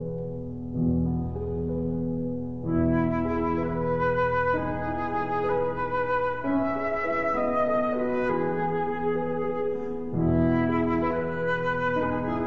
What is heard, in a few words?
Berceuse (Lullaby) for flute and piano